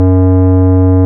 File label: ORG, Farfisa; Roland LCDP09 Keys of the 60s and 70s 1; Farfisa Flts; Farflt DBars